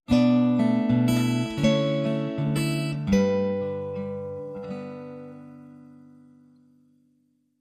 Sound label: Orchestral